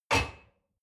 dishwasher cup place soft

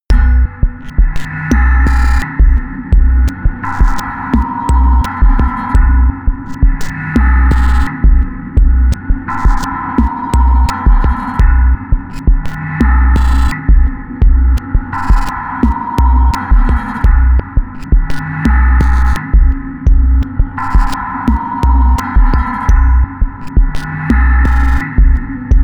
sequence electronic beat dark

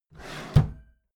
empty drawer smooth open heavy

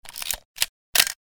camera single shot

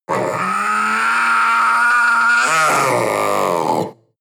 panther young vocal cry

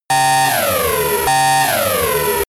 spaceship alarm

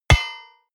hit metal hammer medium

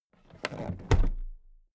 toolbox put down heavy

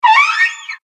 little whale

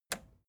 record player repeat release

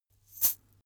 chain mail march single left

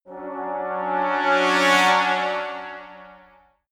trombone crescendo short

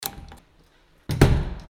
door open close

